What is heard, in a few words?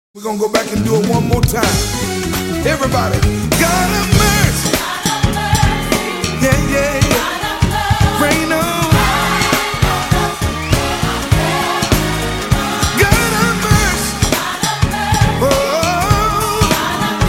Choir